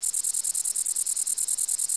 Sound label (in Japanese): sasakiri, insects